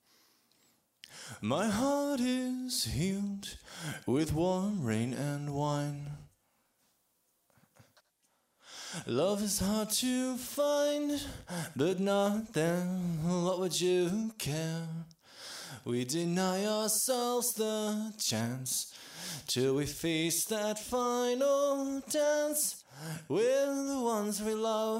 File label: vocals